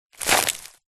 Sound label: foley foot shoe stand on old rotten wooden trunk small; zapsplat